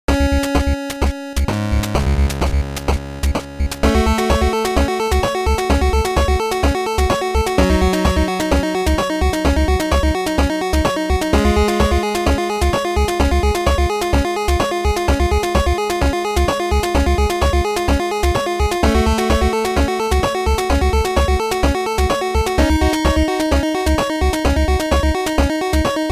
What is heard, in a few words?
Collapsing Peace - Retro Style; Mighty No. 9